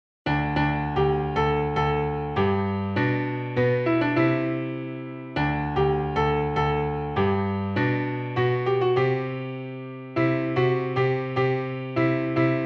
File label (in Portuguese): piano piano